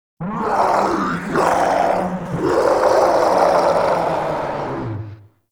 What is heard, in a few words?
Groan; Memory Of Betrayal Start Ability; Warframe; Pax Duviricus